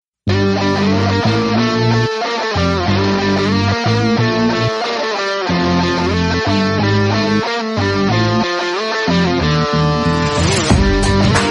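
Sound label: telugu entry ringtone background music; son of satyamurty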